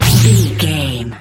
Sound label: sci-fi weapon blaster laser boom zap